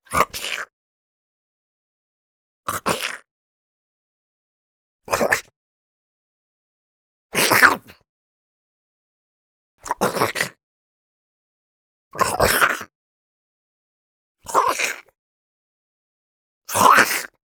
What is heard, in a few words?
ZOMBIE MALE CHEW EATING FAST SHORT